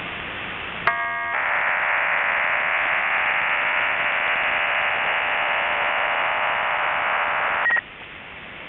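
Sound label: 28-TONE OFDM WAVEFORM (PARALLEL); 28-TONE "SkyOFDM" OFDM / WAVEFORM (PARALLEL)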